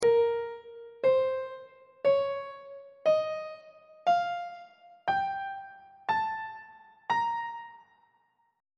a sharp melodic minor key signature on treble clef